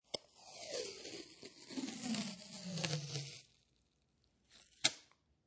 Pulling Tape Off Tape Dispenser, Long tape stretch, tape unwind from tape dispenser; Offices